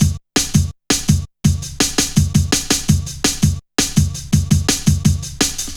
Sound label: Fat Rolla, Jungle Drum Loops 166.5